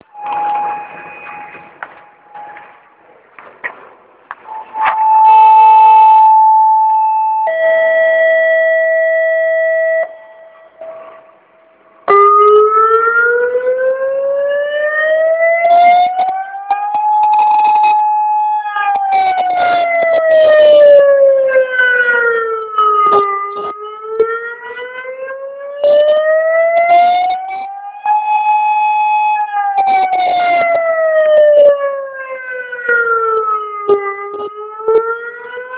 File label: siren; hosey siren